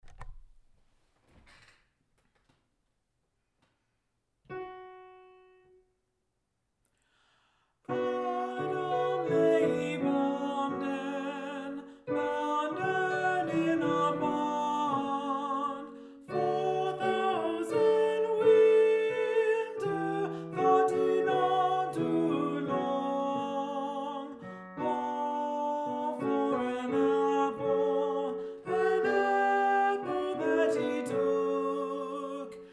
Adam Lay y Bounden Alto; Adam Lay yBounden, B. Ord

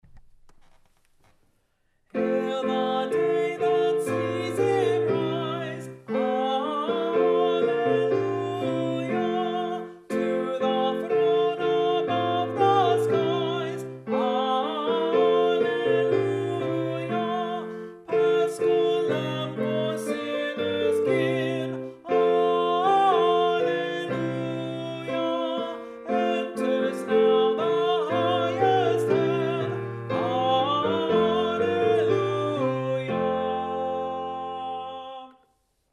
Hail the Day Alto; Hail the Day that Sees Him Rise

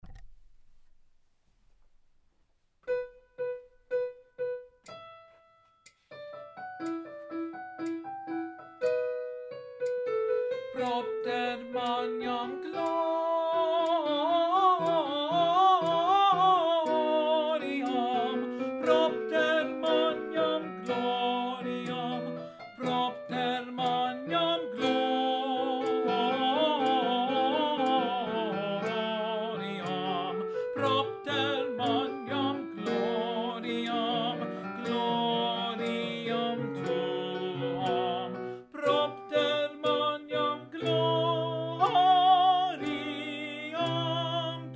Slow Tenor; Propter Magnam-Slow; Choral Resources

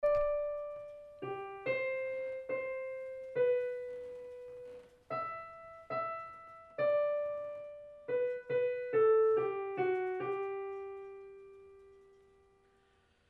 Once in Royal Bass; Once in Royal David’s City, Arr. D. Willcocks

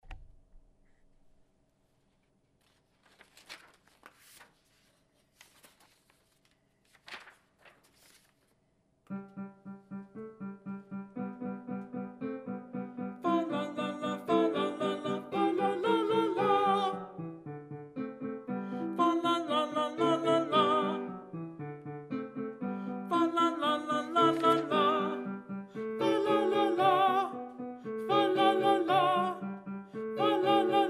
30 Second Fa La La Alto

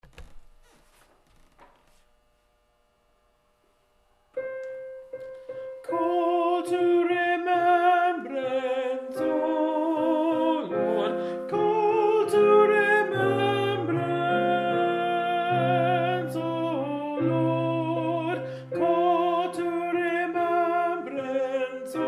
Call to Remembrance Alto; Call to Remembrance, D. Farrant; Lent; Choral Resources

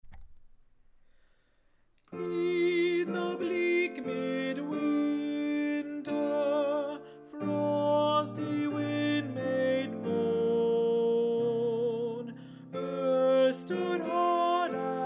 In the Bleak Alto; In the Bleak Midwinter, G. Holst

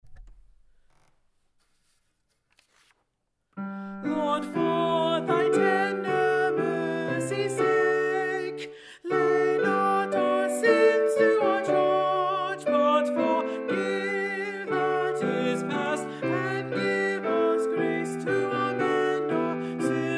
FAST Alto; Lord, For Thy Tender Mercy’s Sake-Fast, D. Farrant; Lent

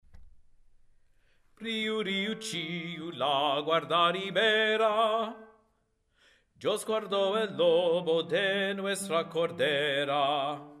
Riu Riu Tenor; Riu, Riu, Chiu, M. Viejo; Choral Resources

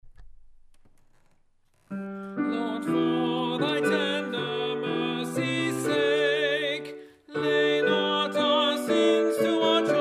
FAST Tenor; Lord, For Thy Tender Mercy’s Sake-Fast, D. Farrant; LENT; Choral Resources

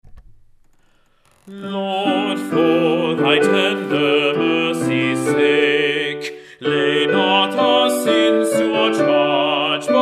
FAST Bass; Lord, For Thy Tender Mercy’s Sake-Fast, D. Farrant; LENT; Choral Resources

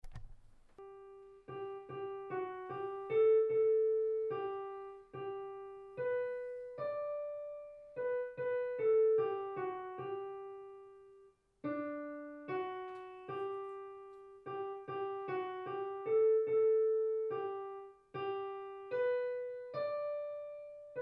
Once in Royal David Alto; Once in Royal David’s City, Arr. D. Willcocks

